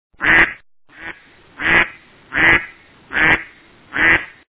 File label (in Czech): kachna